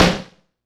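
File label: Acoustic snare with Amp Mod; Drums; Snares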